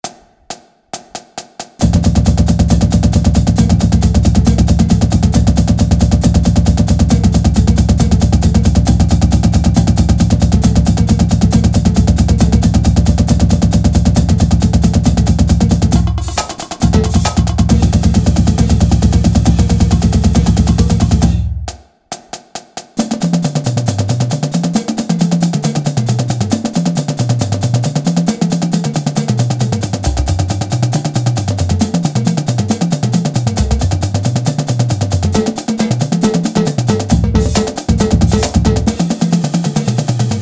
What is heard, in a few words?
Stick Control, drumline